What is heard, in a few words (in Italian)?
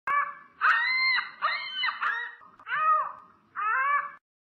struzzo